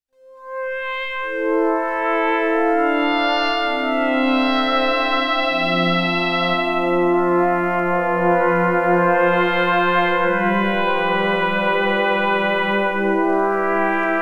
Polysynth Sounds; Mega Synthesis

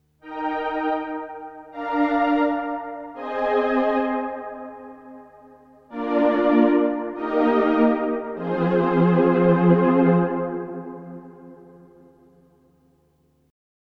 Synth Pad; Studiologic Numa X SE

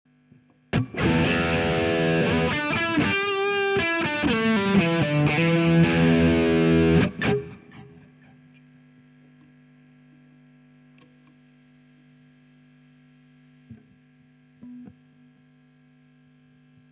Tele Hum; Haar

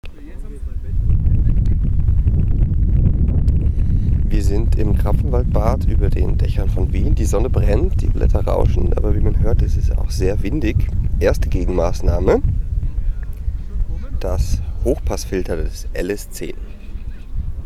wind

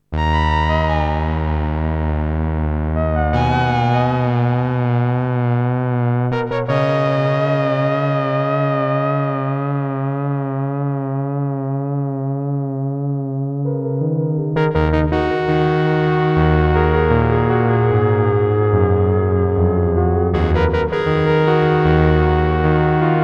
performer single filter

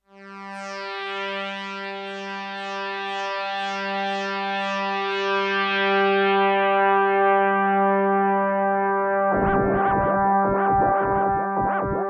Moog Muse Delay

